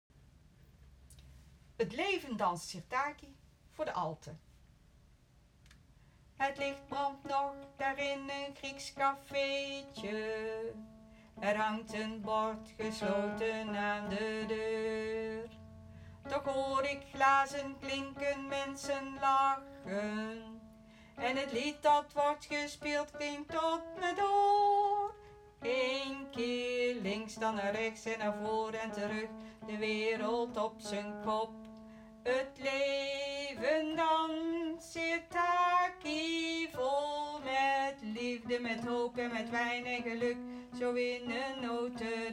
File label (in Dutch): Het leven danst Sirtaki Alten